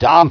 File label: pain